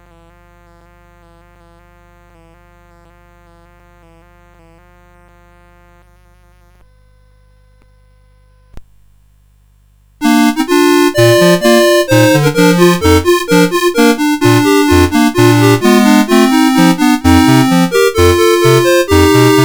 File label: Jolly Coppersmith Polka, CSG8580R5